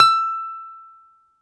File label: BALALAIKA, Russian Strings